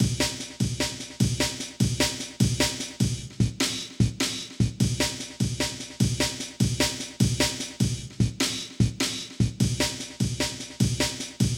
breakcore drumming

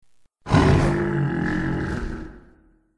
grunt

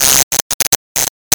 error

